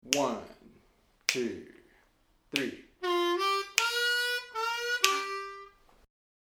Lick Slow, harmonica

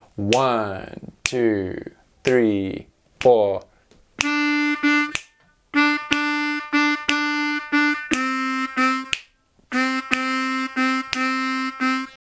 12 Bar Blues Lick Slow, harmonica

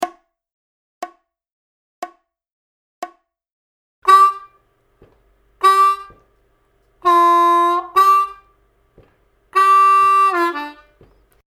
Intermediate Licks in G 60BPM, harmonica

